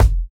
dry bass drum one shot f key; kick drum samples

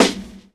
wet snare sample g key; snare drum samples

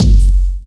small reverb kick drum one shot f sharp key

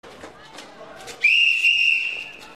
refferee blows whistle

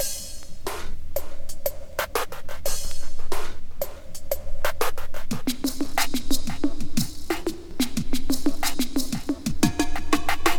120 bpm drum beat g sharp key; drum loops

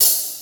clean open hat sound e key

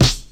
short acoustic snare sound b key

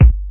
warm kick drum sample a sharp key; kick drum samples

